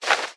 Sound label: Heart Container Land; A Heart Container landing on the ground